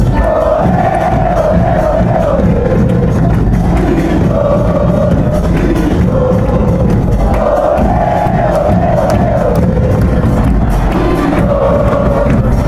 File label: ole ole ole, chant; millos mfc